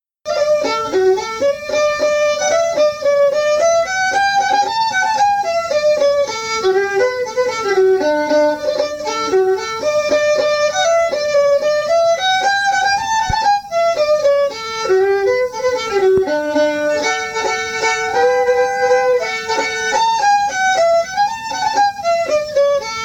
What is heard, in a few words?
fiddle; road to lisdoonvarna